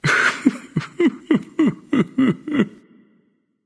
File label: boo hoo; smite; voicepack